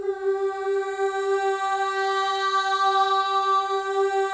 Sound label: MOVING V2, Symphony of Voices; Spectrasonics